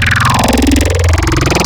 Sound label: Growl Table, Serum; Cymatics